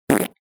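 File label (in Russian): звуки пук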